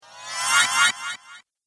Casting Spell, Magic Sound Effects

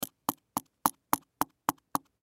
Pen Tap Small Note Pad; Office; Pens; Offices; Blastwave

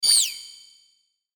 WHOOSH SQUEAK, Whoosh Squeak Shimmer; Blastwave

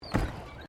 Footsteps Boots Suspension Bridge Wood Wire Step; Footstep Foley; Footsteps (Sound Effects:Human)

